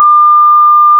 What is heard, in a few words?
create a sound that mimic, call end